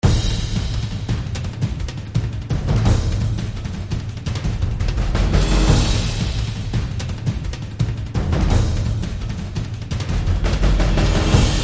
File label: Fast & Furious Movie Drums